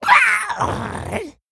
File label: Kobold Emote Death; Kobold Miner